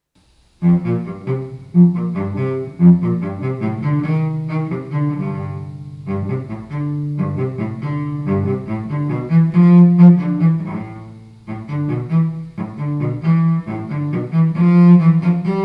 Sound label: cello